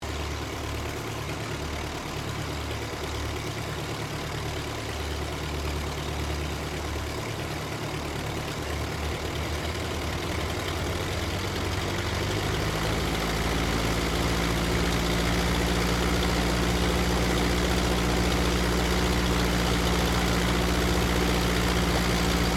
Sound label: AIRCRAFT DEHAVILAND DHA-3 DROVER PREFLIGHT, tri engine; Airplanes